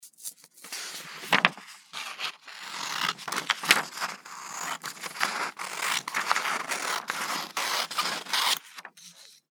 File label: paper cutting